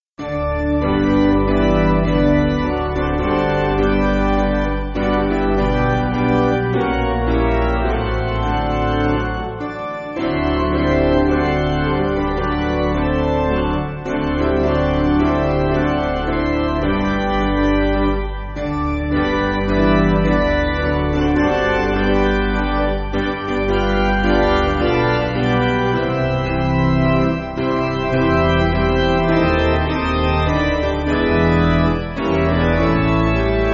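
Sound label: Piano, Music Backing Files for Churches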